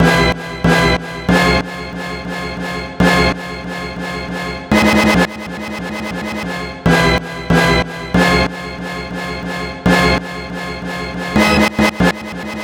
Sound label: Guitar Based; Eclectic Electronica